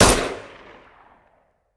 Hand Gun; Sound Ideas; Guns

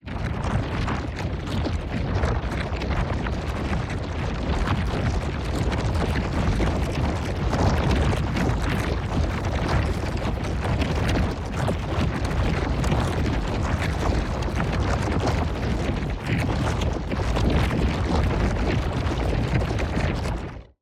TEXTURE Artificial Rocks; boom library; Matter

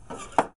Pinball Pull Plunger

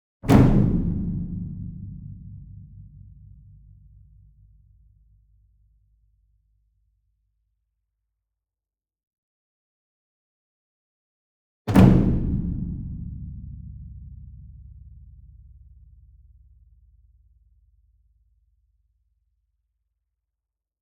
ENSEMBLE DRUM Bass Drum Concert Large Flam Medium; boom library; Cinematic Strikes Construction Kit

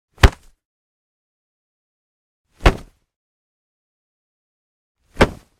Body Impact, Martial Arts and Human Impacts; Hollywood Edge

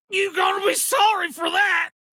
hit reaction; abe; Blind Drive

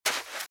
Footsteps Sand Dry Run Left; RadwinIsland

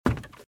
Footsteps - Wood - Creaky - Run - Left

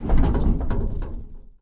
car rattle soft; Blind Drive

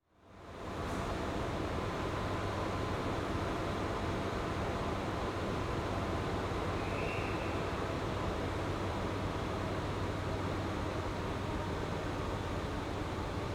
Trains leaves exterior; Travel & Transport

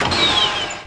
Pickup Scifi; SFX Kit

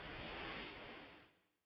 Smooth Wall

